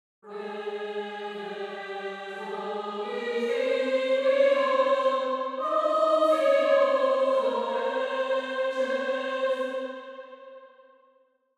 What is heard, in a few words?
Angelic choir angels; reveal the ethereal beauty of the angels